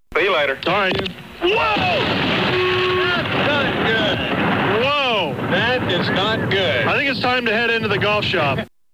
lightning

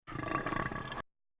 Alligator Burp

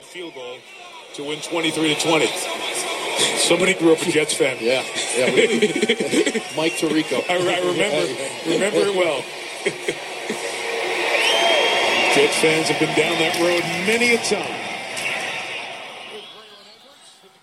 Laugh; Mike Tirico

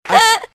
Laugh

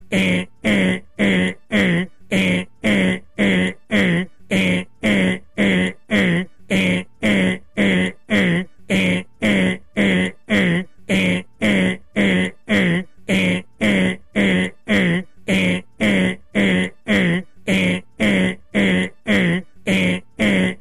alarm clock

